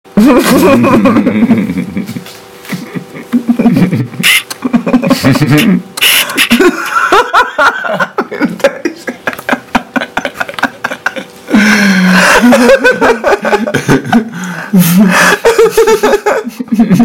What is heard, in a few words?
laughter